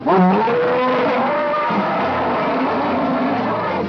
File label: roar